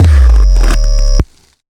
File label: cinematic trailer impact; Bluezone; Thorium - Cinematic Trailer